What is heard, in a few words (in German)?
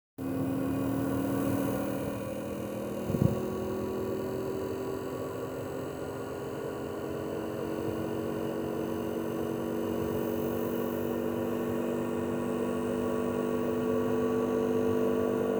Heizung Nugget, standheizung springt nicht; hilfe standheizung springt nicht an